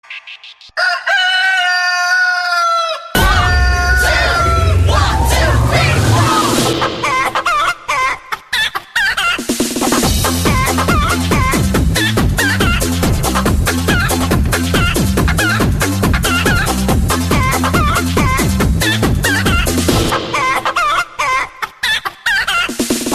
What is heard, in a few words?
chicken song